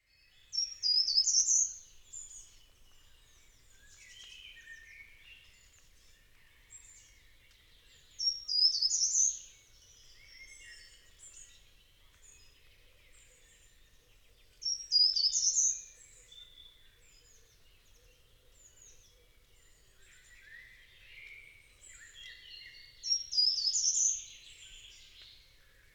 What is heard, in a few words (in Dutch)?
boomkruiper; David Sluis